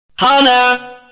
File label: vault hit stinger; sinners